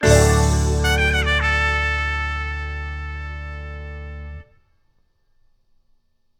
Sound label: Finish Noon; Everybody 1-2-Switch!